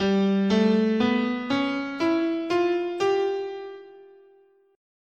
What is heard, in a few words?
G Prometheus scale